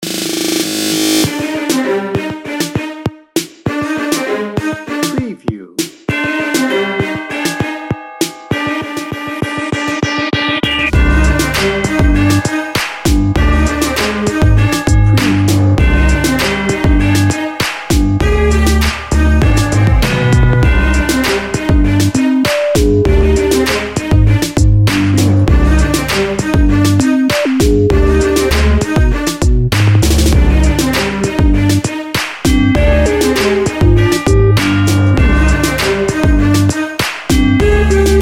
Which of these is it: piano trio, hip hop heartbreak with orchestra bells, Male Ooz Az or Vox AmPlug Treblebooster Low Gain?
hip hop heartbreak with orchestra bells